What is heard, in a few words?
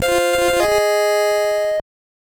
Unused Jingle